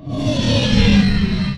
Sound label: shields down